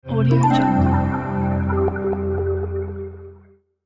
plucked synth with gurgle notification accent; sound ideas

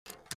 foley office pen pickup; smartsoundfx

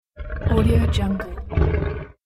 roar lite

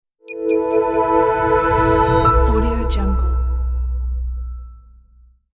bright modern logo

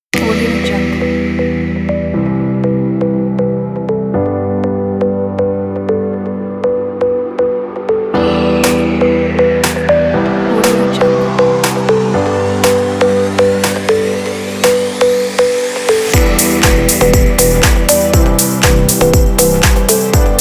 a deep house

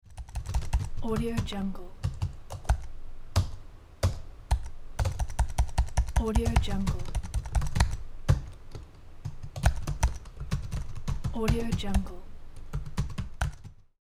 typing keyboard

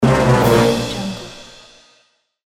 short game over

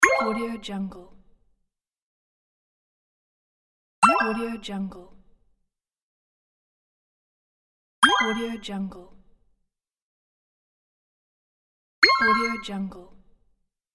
arcade bonus sequence; floraphonic

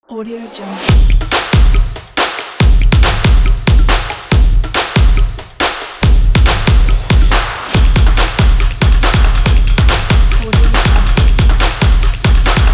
drum logo stomps

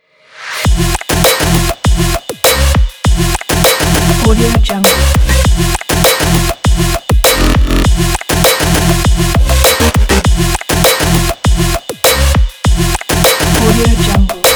robo breakbeat

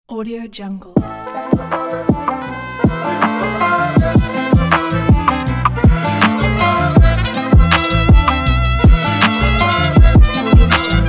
latin hiphop

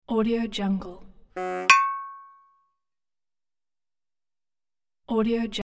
cartoon horn clang accent

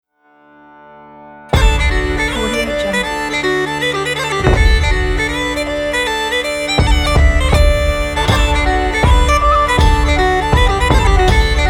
medieval party; flaviocortizo

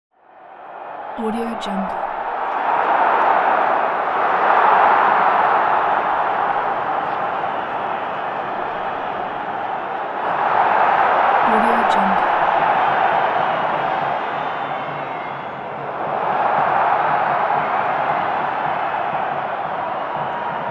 the noise of the stadium; fatsound

